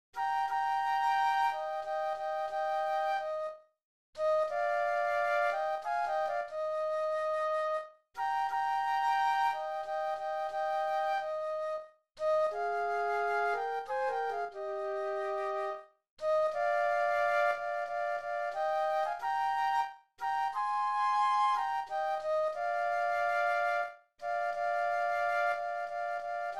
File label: flute duet; classical